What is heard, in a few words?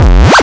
dirty bass whoop end; Basses